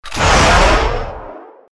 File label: ROCKET